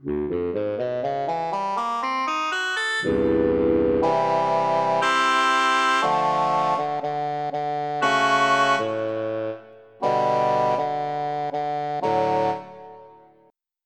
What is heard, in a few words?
Shanai; EverQuest